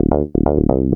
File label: BSE 125 C, BASS LOOPS, House